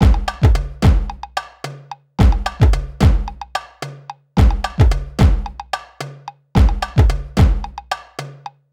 Hip Hop Beat, Drums; Digidesign; Pro Tools; Pro Tools Essential Loops; Drumbeats

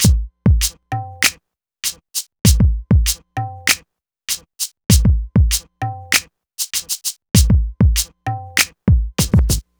E Coast HipHop Drums; East Coast; Digidesign; Pro Tools; Pro Tools Essential Loops

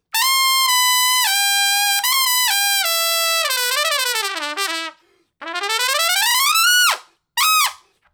160 Trad Jazz Trumpet Solo _Dmaj; Brass; Pro Tools Essential Loops; Digidesign

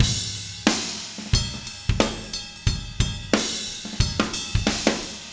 090 Shuffled Rock Drums Chorus; Pop Rock; Moderate Tempo; Pro Tools Essential Loops; Digidesign

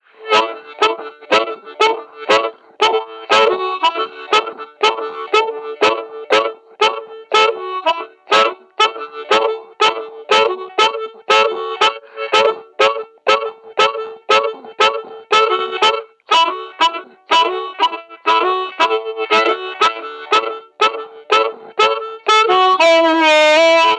Blues Harp; Digidesign; Pro Tools Essential Loops

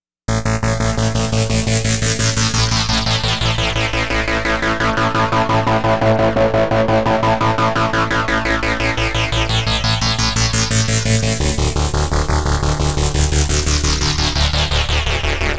Virus Arpeg; Pro Tools Essential Demos; Digidesign

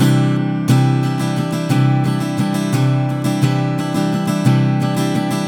Pop Ac-Guitar Strum (Add9) Cmaj; 088; Pop Rock; Acoustic Guitar; Pro Tools Essential Loops; Digidesign

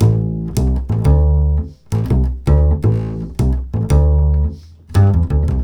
NuJazz Bass Amaj; 085; Pro Tools Essential Loops; Digidesign

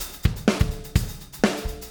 125 Disco Drums, Fast Tempo; Pop Rock; Digidesign; Pro Tools; Pro Tools Essential Loops

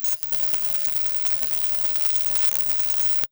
drums

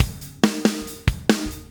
140 Groovy Fast Drums, Very Fast Tempo; Pop Rock; Pro Tools Essential Loops; Digidesign

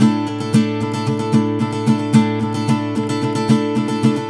Pop Ac-Guitar Strum (Sus4) Amaj; 111; Pop Rock; Acoustic Guitar; Pro Tools Essential Loops; Digidesign

